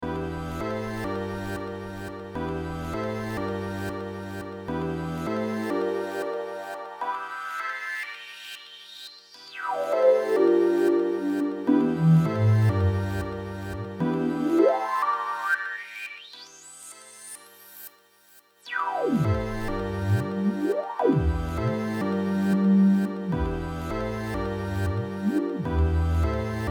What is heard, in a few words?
HP Sweep